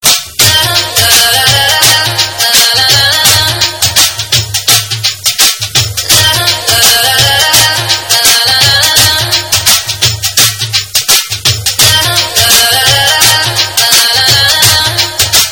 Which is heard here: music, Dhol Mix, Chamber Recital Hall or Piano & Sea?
Dhol Mix